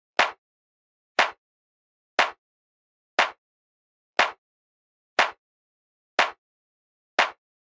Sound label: Clap, Dancefloor Pop; Remixlive